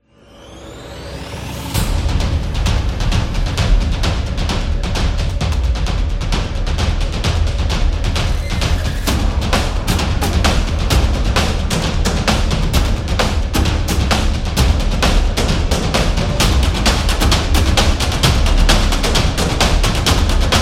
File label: Sport Drum; percussive nation vol 4